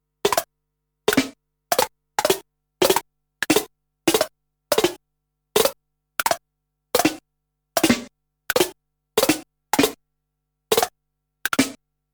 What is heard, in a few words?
droplet